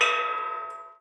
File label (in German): metallklang; klang im schnee